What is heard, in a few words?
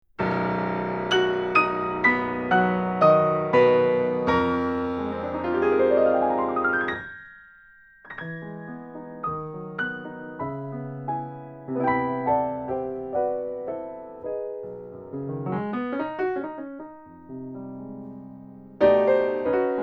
DPA 4011, record a piano; how to record a piano